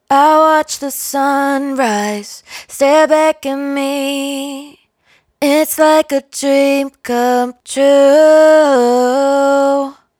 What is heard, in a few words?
hook 90 G#m, Dry Vocals; Raw Vocals; Sunrise; Slate Digital Omnivox Vocal Sample Pack